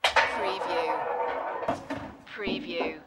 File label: Billiard, Pool, Snooker - Ball delivery mechanism in table